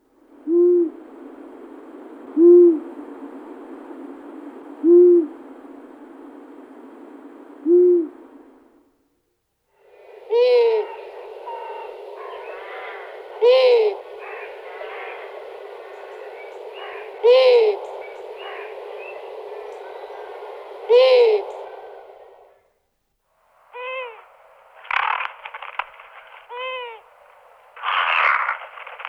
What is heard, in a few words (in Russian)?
a.otus, golosa strigiformes